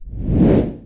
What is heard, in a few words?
swing; operation